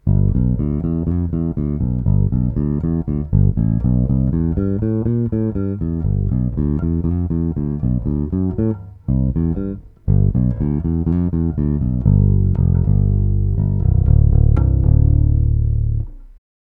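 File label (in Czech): Warwick RockBass Infinity 5 Fretless, Warwick Red, nic - jednocívka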